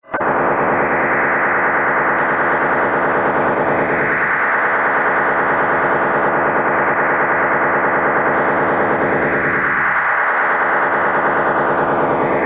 Packetized OFDM

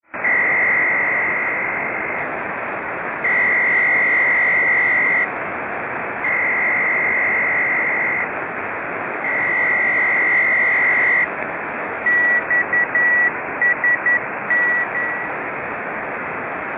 Global Link Network Station Transmission

